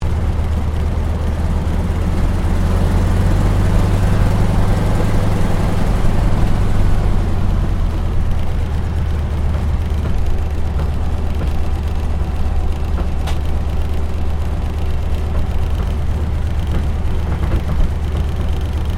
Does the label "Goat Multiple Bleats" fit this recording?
No